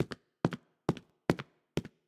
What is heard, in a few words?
Footsteps Concrete fast; BLIND QUEST - The Enchanted Castle